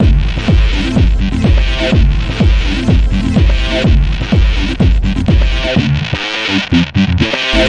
125 pumping kicks and bassline; SAMPLED MUSIC LOOPS

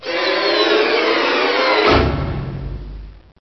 Orchestral Gliss

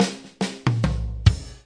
six eight Fill, DRUM LOOPS and BREAKS

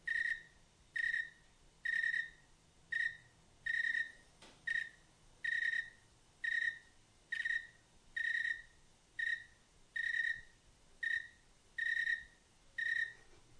cricket; Sound FX Real Life

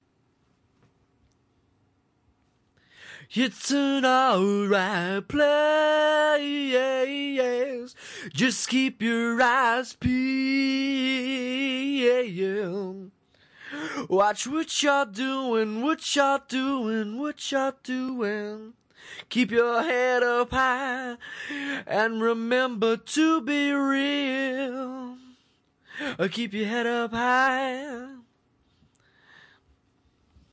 180 bpm male vocal acapella

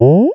question, SFX; Scars FNC SFX

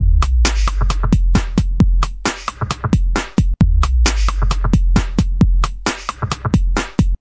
133 Lowride, drum loops

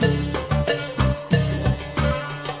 middle east mystery, SAMPLED MUSIC LOOPS

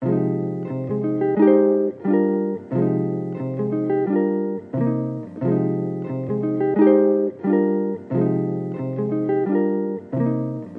089 glowing guitar riffs; SAMPLED MUSIC LOOPS

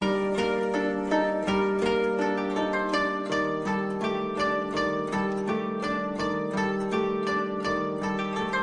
shamisen loop 6 bars; WORLD LOOPS

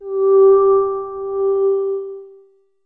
pretty voxy tone; Vocal

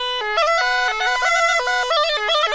094 afghan bagpipes; SAMPLED MUSIC LOOPS

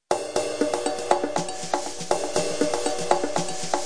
120 latestart brush rolls, DRUM LOOPS and BREAKS

